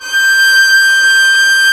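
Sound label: G6, strings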